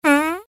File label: Fart; SFX Producer